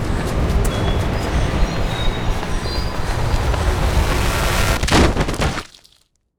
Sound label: falling to death; mirrors edge